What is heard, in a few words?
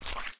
step; minecraft; block